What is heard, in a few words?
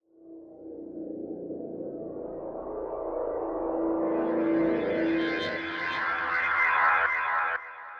Dark Synth, cinematic drama soundbeds; musicradar